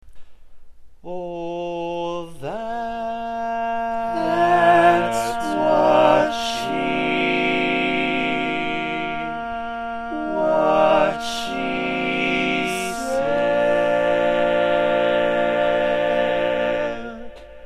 barbershop tags, all parts

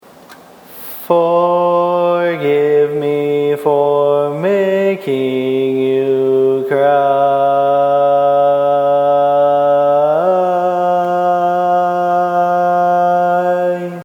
bass

